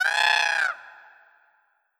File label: Vulture; Legend of Keepers (gamerip) (2019)